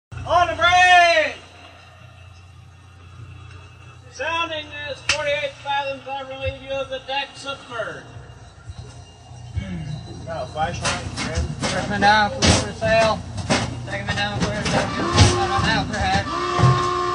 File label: Dive